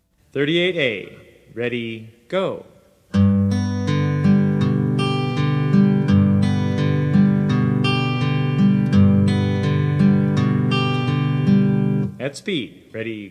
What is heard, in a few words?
travis picking